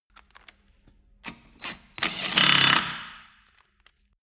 impact wrench screw fast single

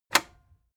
cassette eject release heavy

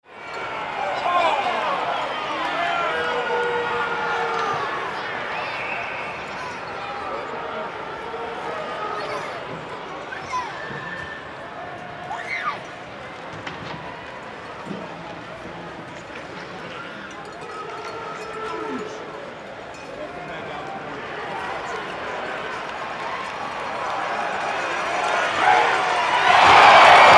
crowd swell ambience

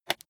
rotary control single turn soft

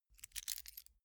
key ring large place soft

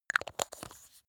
glass bottle close

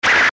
eastern swishes

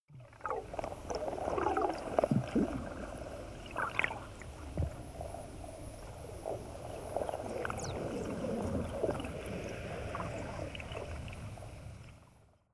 water fx

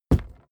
suitcase hit case rattle

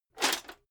metal plate armor movement single

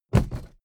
suitcase drop

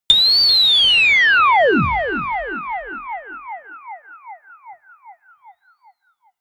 high ululation ufo

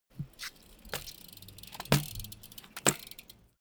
bmx maneuver free lift tire

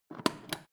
foot switch turn on off

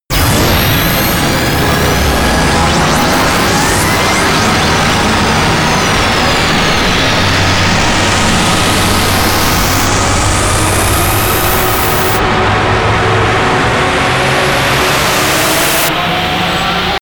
long razor radio rise

